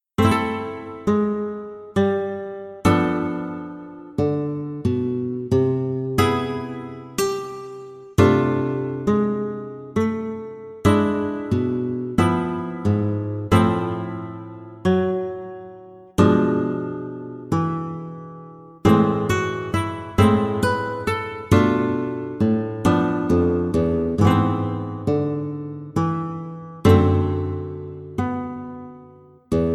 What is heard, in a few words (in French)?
etude harmonie Am